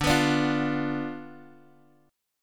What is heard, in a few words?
D-Minor 6th, D, Guitar, Open F; strummed; x,9,11,9,8,x